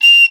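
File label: piccolo staccato F6; Flutes; DirectWave; Varazdin Orchestral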